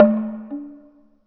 bois profond

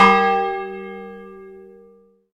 cloch sol

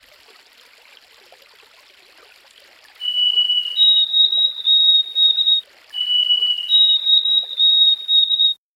bruit mel

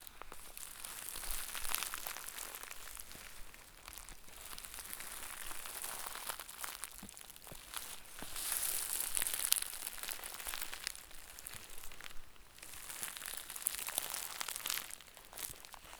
marche epines

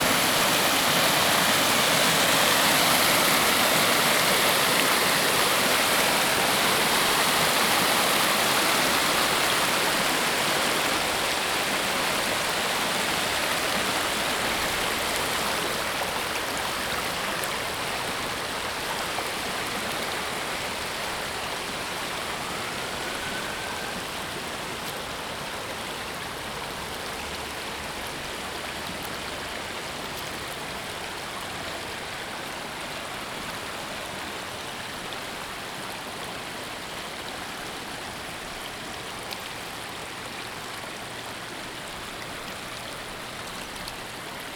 sortie d'eau qui coule; mashteuiatsh

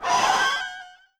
guitare archet

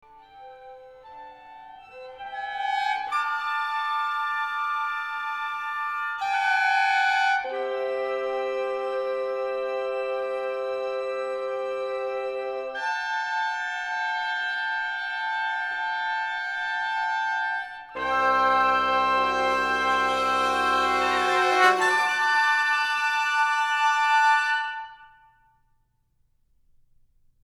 conson disson

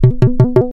poum poum poum pa

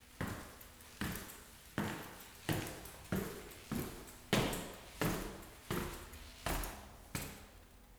descendre marches

